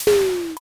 train door

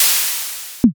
airlock

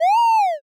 cat meow

